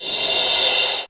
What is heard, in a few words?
REV CRSH, Korg EMX-1, Drum Machines